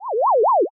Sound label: flashback out